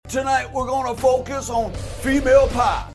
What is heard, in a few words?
female pop